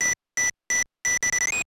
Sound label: Beep; SKY IM-S400L; Camera